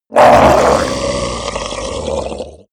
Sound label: orc die